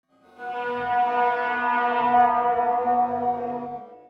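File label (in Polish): ST44 Las, ST44, forest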